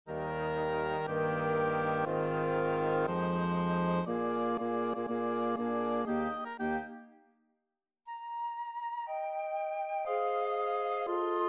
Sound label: 5tet, midi; Weber